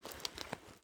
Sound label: generic cloth movement, weapons handling